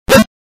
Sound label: death; Mario1-1